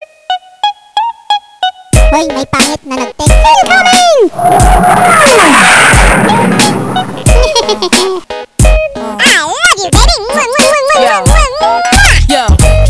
chipmunk

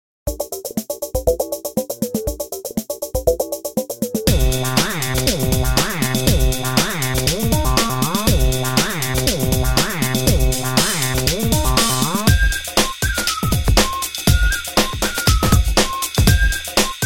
electronica trance

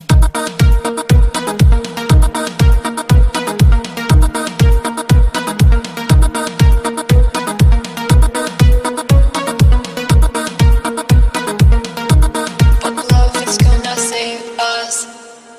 electronica

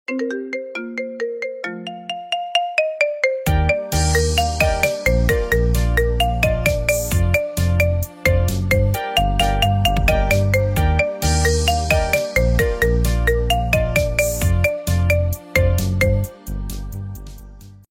marimba remix

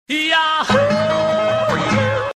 yahoo yodel

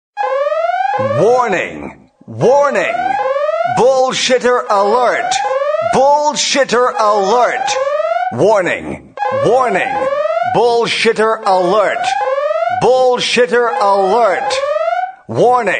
Alarm

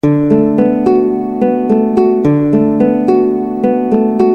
harp relaxing

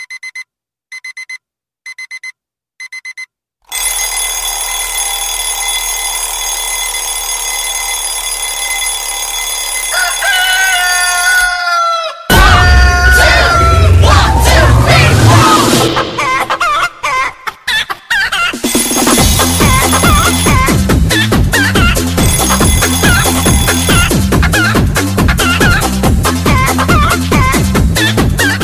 alarm clock

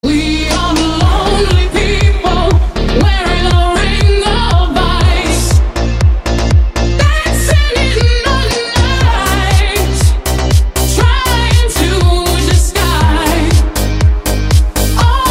ring of ice

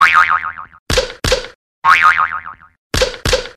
cartoon punches

